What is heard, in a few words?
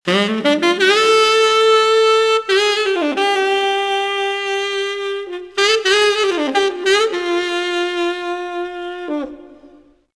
tenor sax